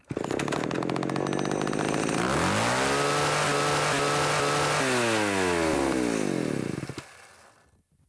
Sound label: chainsaw; zod